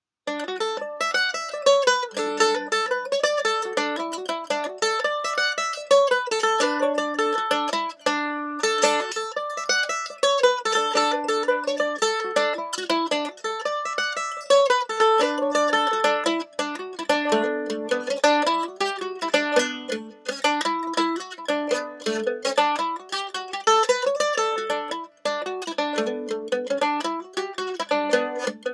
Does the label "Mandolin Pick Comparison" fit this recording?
Yes